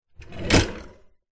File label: household bathroom cabinet drawer open